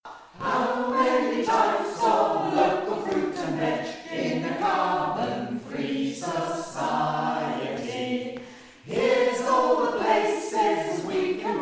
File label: In a Carbon Free Country Garden All